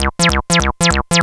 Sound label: square